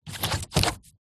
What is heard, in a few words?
car windscreen wiper movement by hand; transport